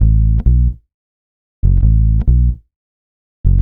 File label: Electric Bass